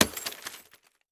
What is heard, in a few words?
Crash Concrete Dropping On Glass Debris Short; crashes; AdobeCC